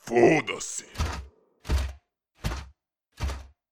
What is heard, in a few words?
Black Knight Walk; Black Knight Walk 1